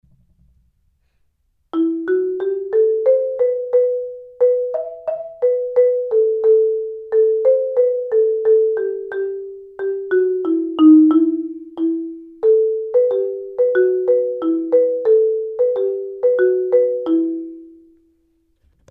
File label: mallets